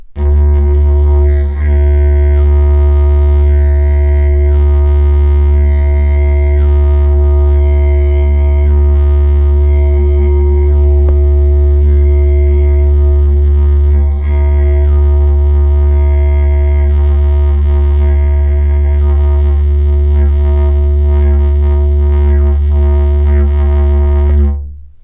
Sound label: Didgeridoo